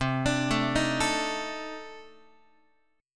C Minor 6th Add 9th C Guitar; Cm6add9 arpeggiated; JGuitar

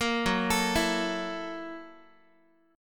Gb 7th Sharp 9th Gb Guitar; Listen to Gb7#9 arpeggiated

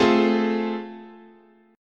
A Minor Major 7th Sharp 5th, chord, guitar

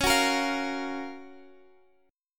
Gb Major 7th Db, guitar

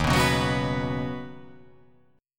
Eb Minor 7th Sharp 5th, down; strummed; chord; JGuitar

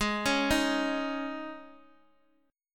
G sharp Suspended 4th chord, down; arpeggiated

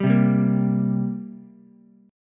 G Minor Double Flat 5th, down, Ukulele; strummed; JGuitar

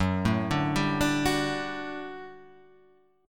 G Major 7th Suspended 2nd Gb

